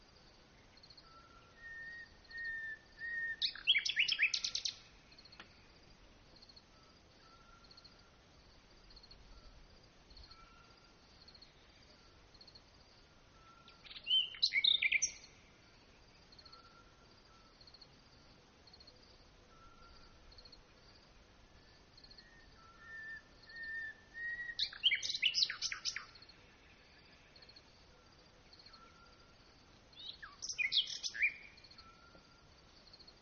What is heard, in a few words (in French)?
rossignol sitelle petit duc grillon nuit; chaudon